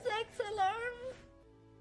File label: alarm